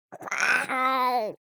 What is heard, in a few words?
BABIES TALK Boy 12 months Growl; Baby Boom